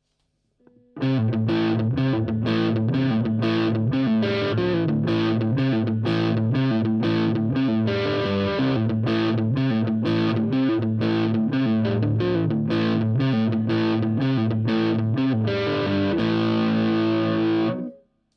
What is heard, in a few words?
Standard HP gain bridge